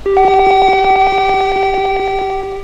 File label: regressive bleeping